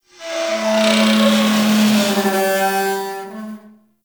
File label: Ocean Pipe Moan; Warframe; Grineer Ocean